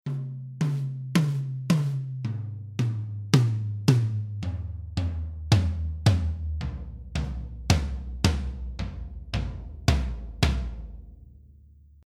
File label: TOMS